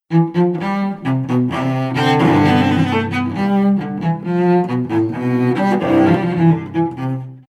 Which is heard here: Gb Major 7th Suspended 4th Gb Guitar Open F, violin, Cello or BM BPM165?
Cello